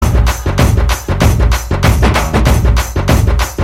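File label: background music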